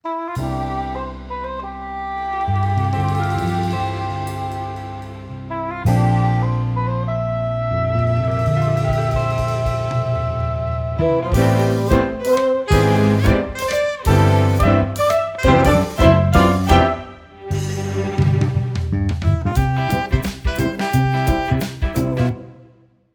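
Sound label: bgm 175bpm C major; bigcity; chicory a colorful tale; ps4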